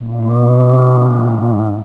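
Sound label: zombie battle, creature; GammaAge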